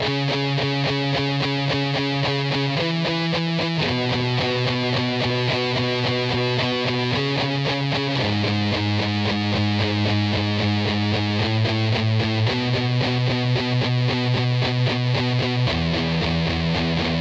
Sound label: bridge slomo